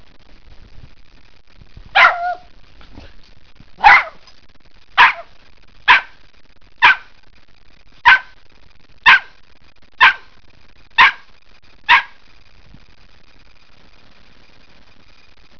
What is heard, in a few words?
terrior bark